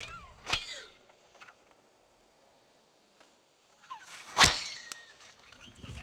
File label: arrow fired with whistling flight and distant impact; weapons